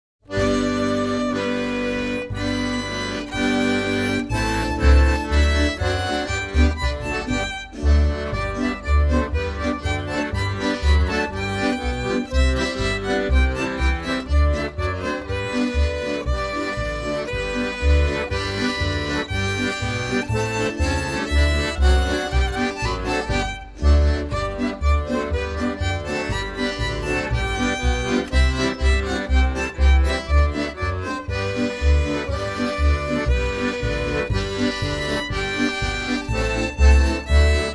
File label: karaoke; Zasadil som čeršničku